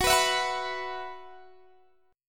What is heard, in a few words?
Gb 7th Suspended 4th Gb down Guitar Drop D